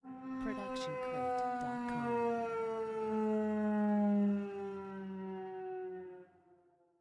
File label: Cello Bends Gliss; SoundsCrate